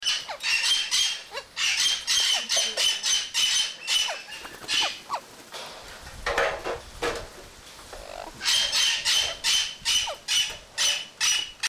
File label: Parrot Cries